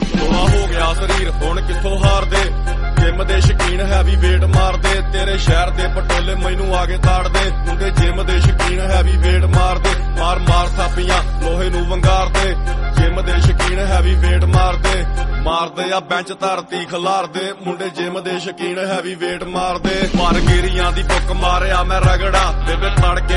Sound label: punjabi song